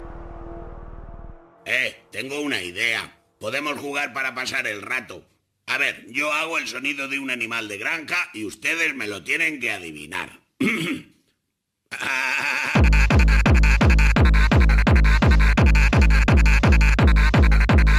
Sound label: techno; moes